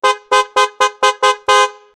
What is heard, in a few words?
car horn sound repeating